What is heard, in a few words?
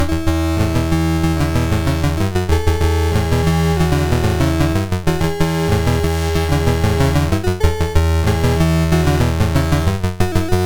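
Magicians Ball, MOS 6581 SID chip music, games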